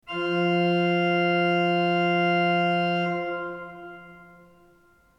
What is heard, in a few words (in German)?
ton, orgel